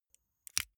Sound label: lighter electric switch flame on gas